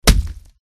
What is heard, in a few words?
impact blunt